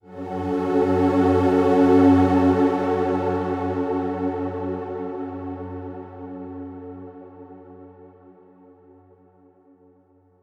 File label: Atmo F#; Vengeance Samples; VTS1 Bonus Sounds